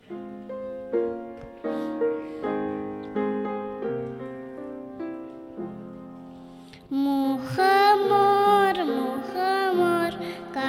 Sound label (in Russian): Мухомор, мухомор - дети; г. Саки; Записи Богослужений